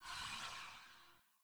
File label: Generic Footstep; Warframe; Choir; Foley